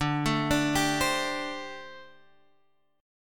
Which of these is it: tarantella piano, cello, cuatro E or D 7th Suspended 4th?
D 7th Suspended 4th